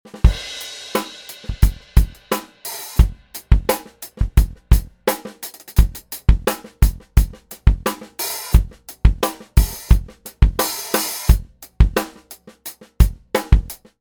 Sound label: Drums